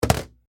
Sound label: Cardboard Box Dropped Foley Small Package; Miscellaneous Impact; Impacts; BBFX